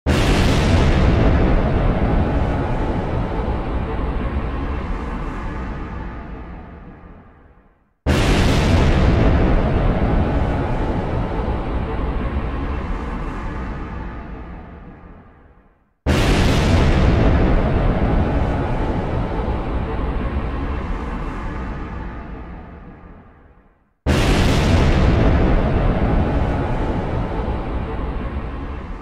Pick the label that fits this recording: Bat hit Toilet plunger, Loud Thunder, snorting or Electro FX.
Loud Thunder